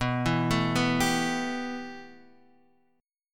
A 7th Suspended 2nd, B

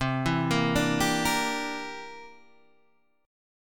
C 9th C down Guitar Open D

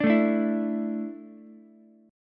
A Suspended 4th A down Ukulele; Asus4 strummed; JGuitar

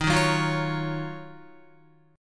D sharp Minor 9th, Guitar; strummed

